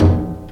classic tom drum one shot f key, Royality free tom tuned to the F note; tom drum samples